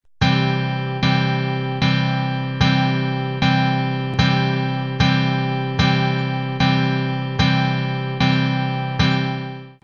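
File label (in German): d dur akkord, D major chord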